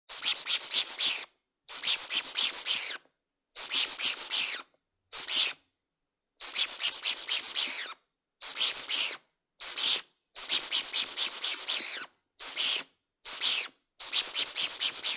Toy Dog Toy Squeaks Many, Foley, Toy, Dog Toy Squeaks, Several; Objects